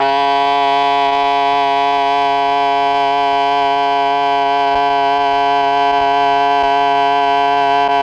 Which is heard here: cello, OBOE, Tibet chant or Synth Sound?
OBOE